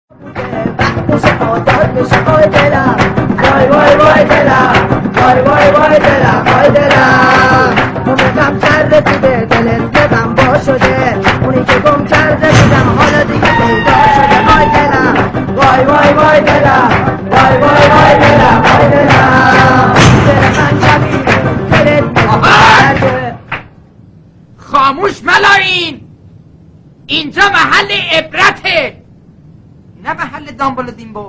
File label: fun music